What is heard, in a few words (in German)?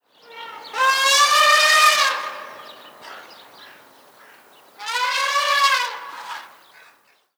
Asiatischer Elefant; Guenter Tembrock